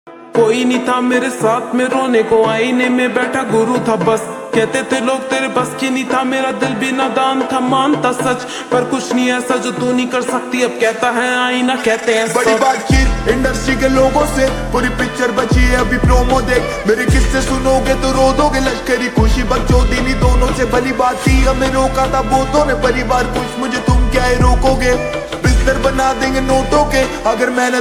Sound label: Slow Reverb Version Ringtone